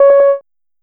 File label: alert doble